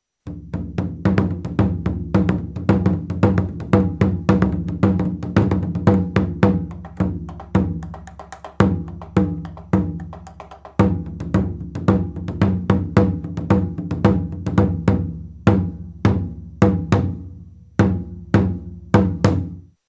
odaiko; instruments

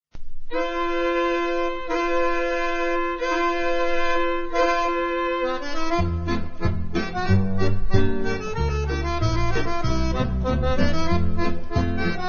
Horlepiep, Pays-Bas

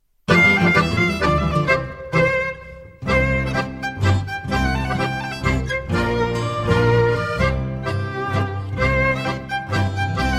Henriks Mazurka, Pays-Bas

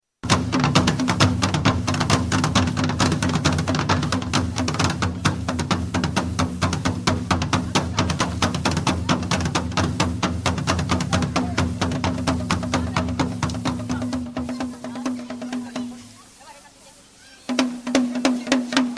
assotor; instruments

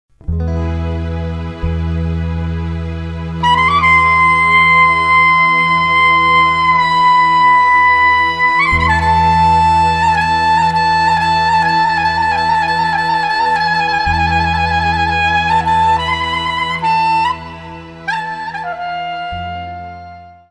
Horo; Bulgarie

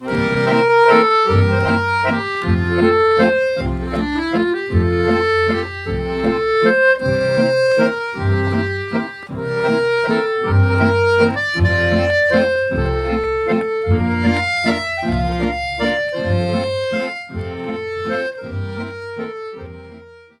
Waltz - Republique Tcheque; Waltz In the Green Meadows

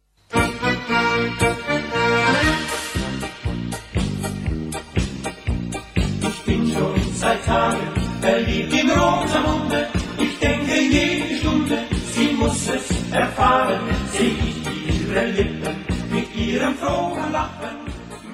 Polka; Allemagne

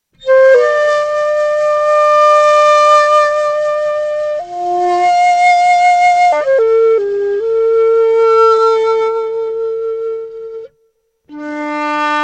shakuhachi

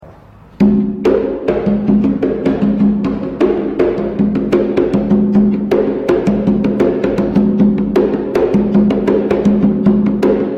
atabaque; instruments

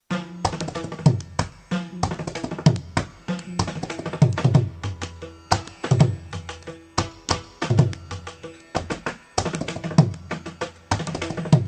mridangam; instruments

